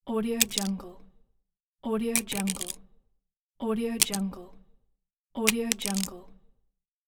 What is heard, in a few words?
credit card drop; sounddivision